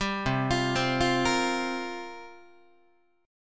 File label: C 7th Suspended 4th, Guitar, chord; C7sus4 arpeggiated